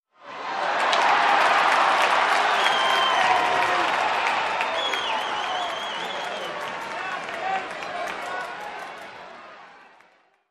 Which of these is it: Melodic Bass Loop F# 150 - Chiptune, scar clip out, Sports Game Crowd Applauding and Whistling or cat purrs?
Sports Game Crowd Applauding and Whistling